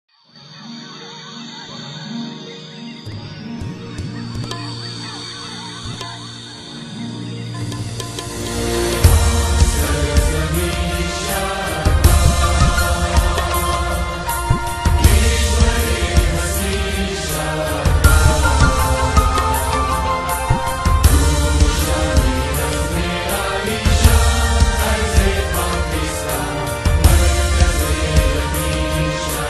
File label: National Anthem of Pakistan; قومى ترانہ; 파키스탄의 국가